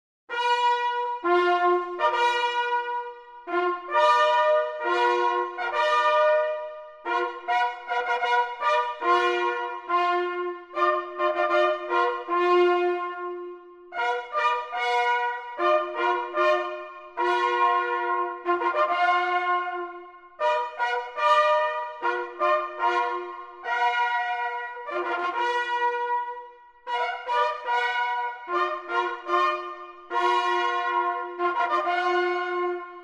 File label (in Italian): Squilli di Chiarine; Contrade; Marcia Palio